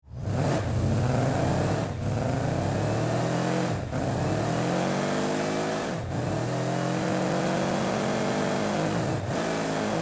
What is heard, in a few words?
Sports car engine acceleration and run exterior